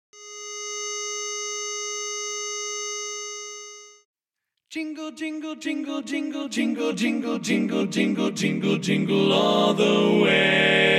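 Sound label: Jingle Bells Baritone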